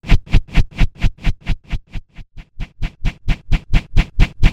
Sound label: boomerang toss